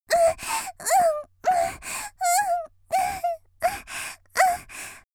「喘ぎ」嬉しそうに, 少女; 元気な少女

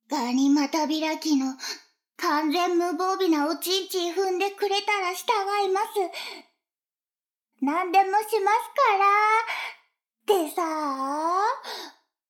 シコシコ音声; 逆時間停止されて

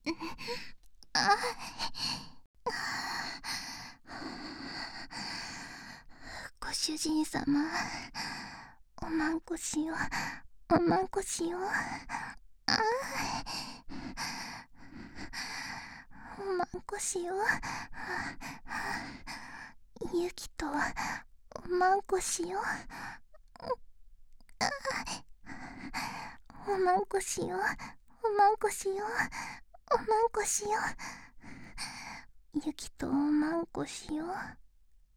喘ぎ; おま○こしよ♪

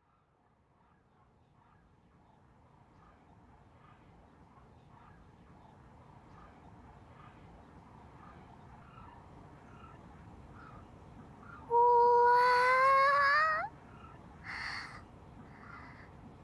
ツルセンター環境音。ツルの鳴き声; 出深市ツルセンター

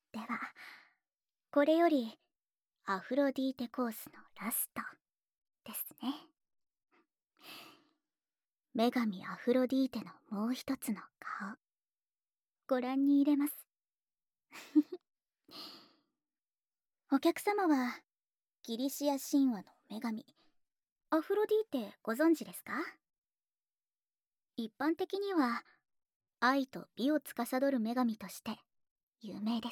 耳舐め＆手コキ

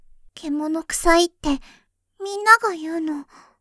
ケモノ

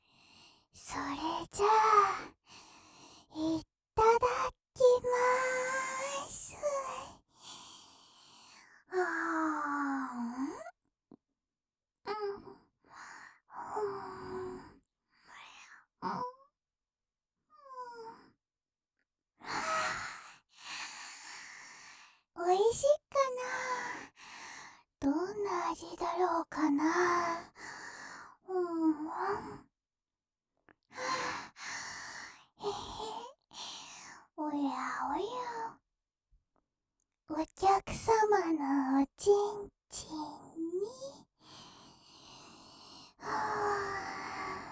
添い寝で、もぐもぐ甘噛み・皮むき, ASMR

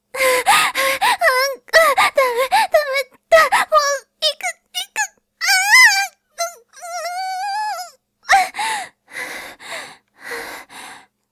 絶頂, ボーイッシュ系音声素材; Honey Drop

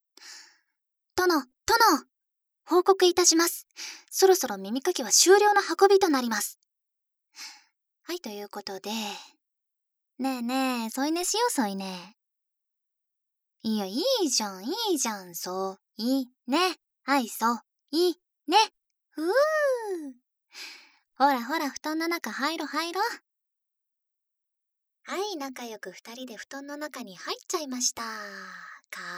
囁き・吐息パート

